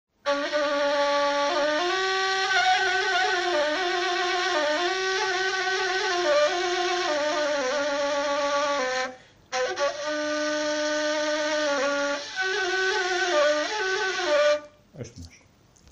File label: Kaval; کاوال